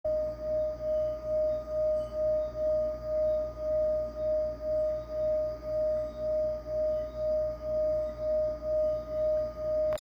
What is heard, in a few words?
Coppery Brown Dorje D Sacral, Meditation Singing Bowl